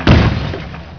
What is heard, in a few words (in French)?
boum